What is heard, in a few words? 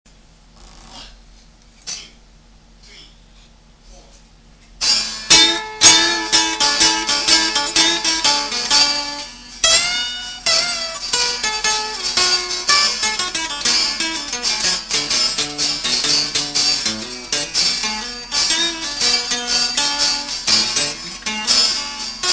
guitar improv lead